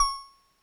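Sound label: Drops C#5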